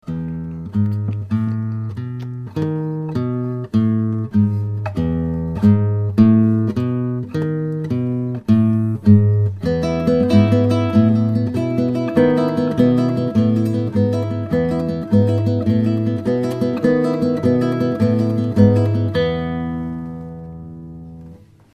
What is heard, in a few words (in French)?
polyrythme, guitar